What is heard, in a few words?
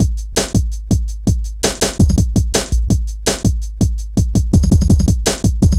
Ezee Rolla, Drum Loops